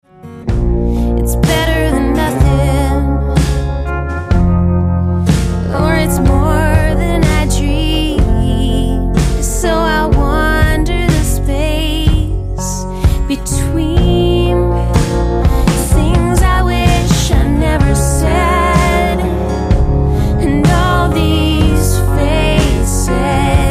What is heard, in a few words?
Slow